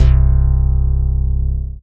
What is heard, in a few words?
DM BAS, Dance Mega Synth; Best Service